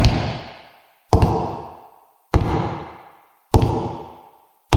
footsteps quiet

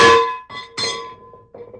metal thing drops and rolls

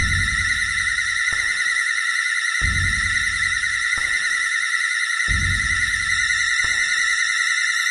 creepy sound; chara

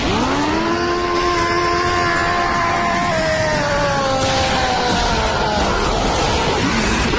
titan roar; eren

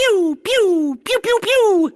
pew pew pew pew pew

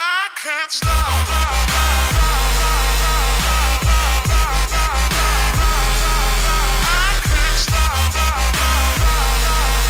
extended drop

